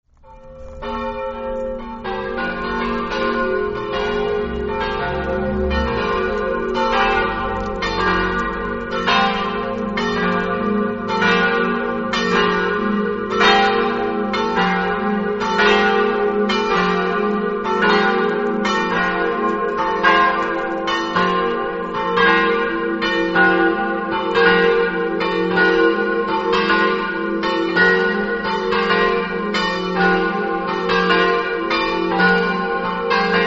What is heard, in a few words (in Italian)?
felettis, campane